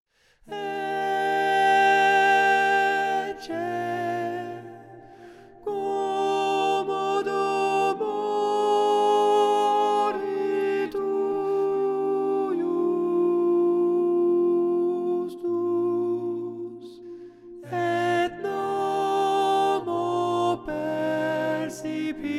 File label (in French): ecce soprane